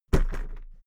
briefcase plastic drop

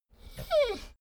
dogo argentino whine short

